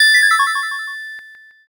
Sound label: ice magic